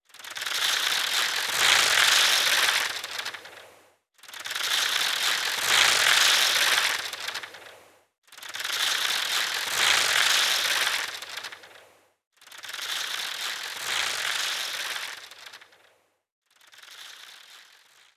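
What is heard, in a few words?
Shop Open; starbites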